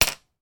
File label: office pen ball point biro drop on table; sound effects one